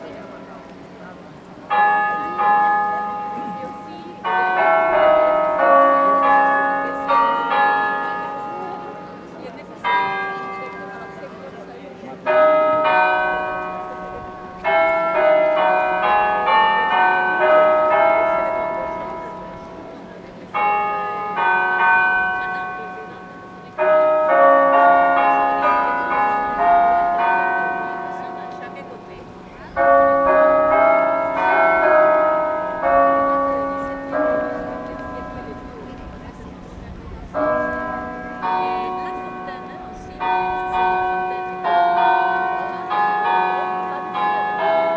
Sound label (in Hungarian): foter bazilika harangjateka